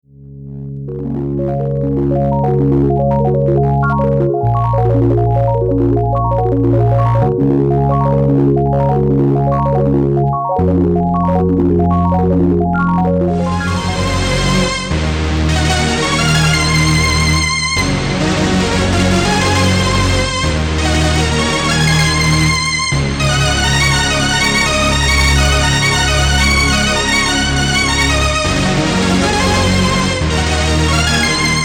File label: rhodes and